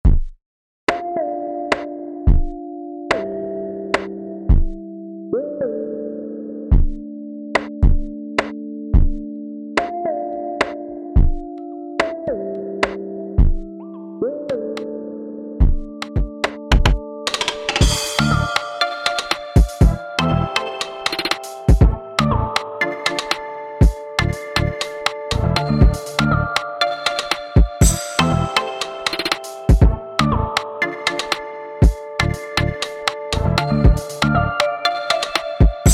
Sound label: Afro Beat